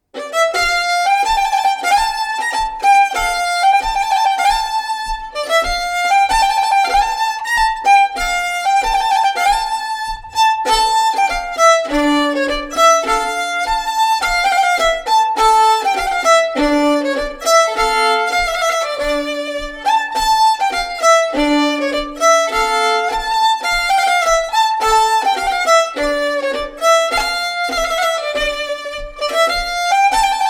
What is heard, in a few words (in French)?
La Bouree; La Bouree de Langerak